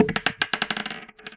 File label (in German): Holzwürfel auf Holztisch